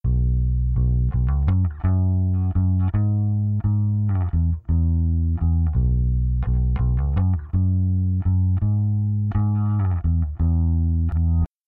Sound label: Live Bass